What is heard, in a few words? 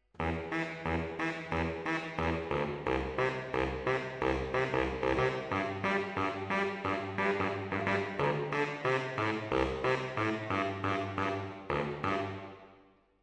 MKH AB, piano